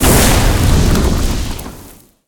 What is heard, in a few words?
skill fire start; agar agar